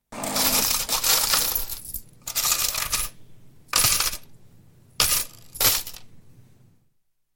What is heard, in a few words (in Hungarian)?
penz csorges